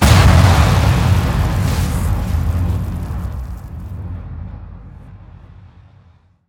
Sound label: Fuel Truck Explosion; Props Fuel Truck Explosion Alt